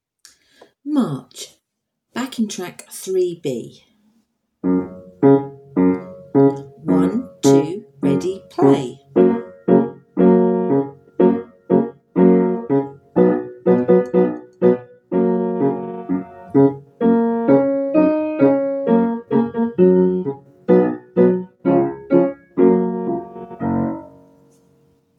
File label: March backing track, keyboard and piano